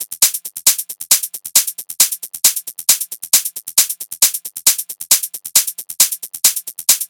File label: Hat Loop 135, Techno